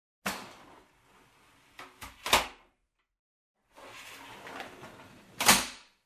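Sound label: plastic door opens closes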